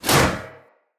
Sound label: Shield Whoosh; Weapons; Swords; Dendrit Sword And Board